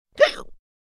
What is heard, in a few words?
hiccup, smeshnye mulitiashnye zvuki